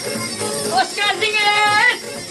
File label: turkish woman screaming